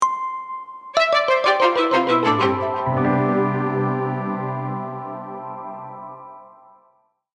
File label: jingle